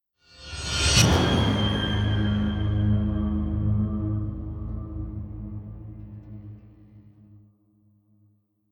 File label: Stinger Drama; Dishonored